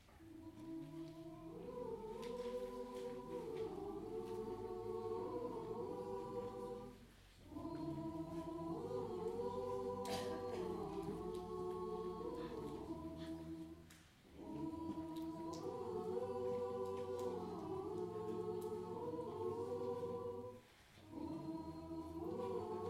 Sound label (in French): choeur; choeur theopolitain a villegongis